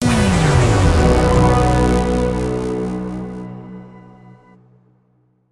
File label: Mission Sting Bust; fat city